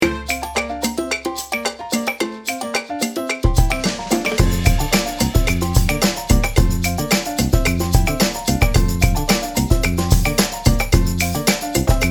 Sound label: Latin Solos Backing, jazz